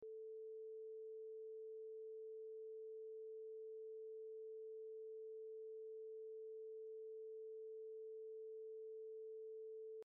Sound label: 440Hz 0dBfs